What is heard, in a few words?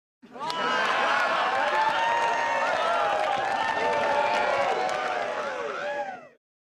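Crowd 50 With Cheers Applause; medium int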